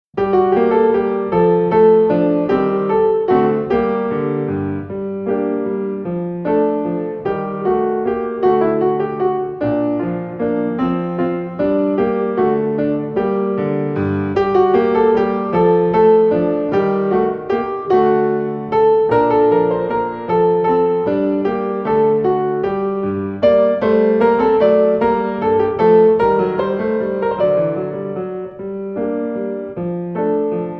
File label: minuet accomp